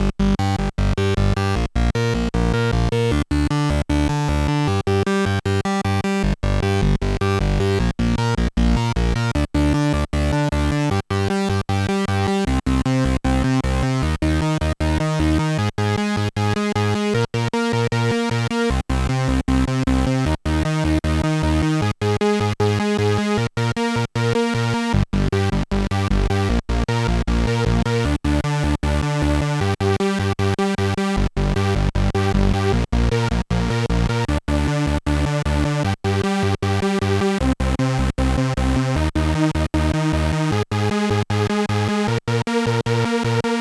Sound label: Groove Synthesis 3rd Supersaw Manually Detuned